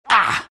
riki pain

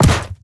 metal heavy drop, inventory; ui

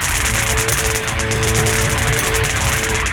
chakra spin; weapons; dota; shredder

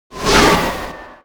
toss target; tiny

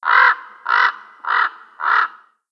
raven; dota

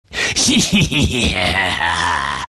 laugh; Venomancer

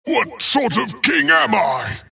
death long; dota; skeleton king

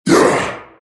grunt; dota; abaddon

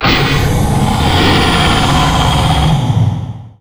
shape shift; dota; lycan

